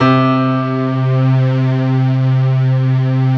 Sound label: PIA Piano String; Sound & Vision; Gigapack I CD 2